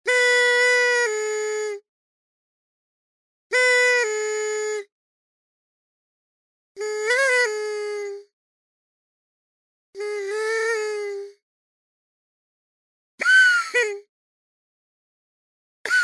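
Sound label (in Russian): партийные звуковые эффекты для трубачей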